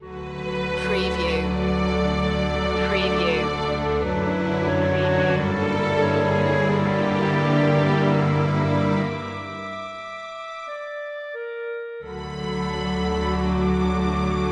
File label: classic romantic